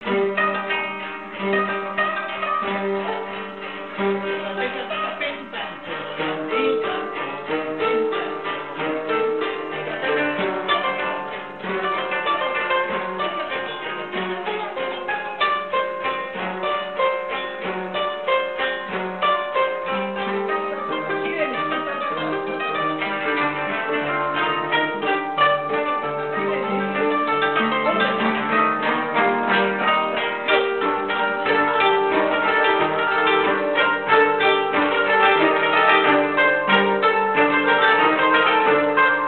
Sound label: koto